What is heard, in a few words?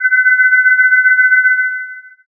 forcefield